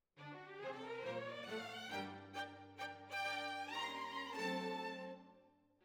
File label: Violins Over